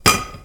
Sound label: pot